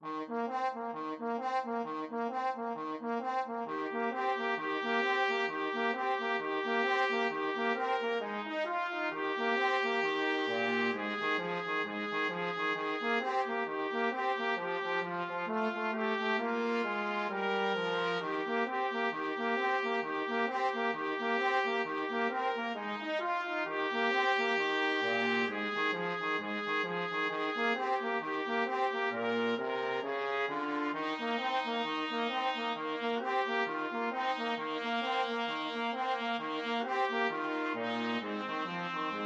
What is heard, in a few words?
jingle bells boogie trumpet trombone duet